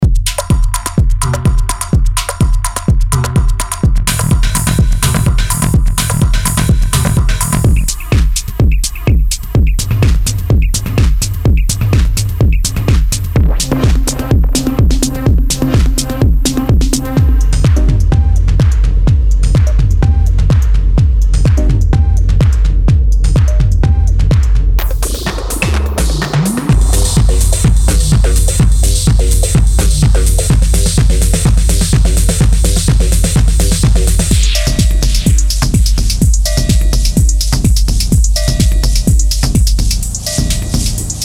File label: Kits Medley Drumsynth only; maschine expansions; grey forge